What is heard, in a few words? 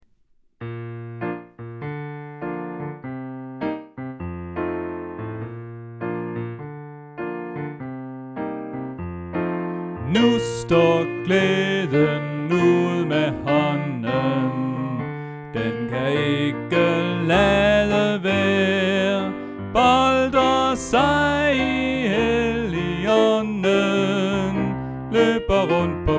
Sopran; oevefiler